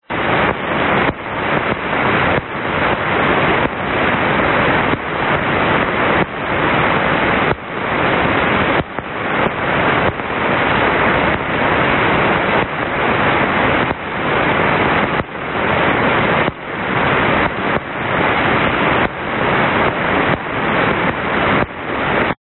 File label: Electric Fence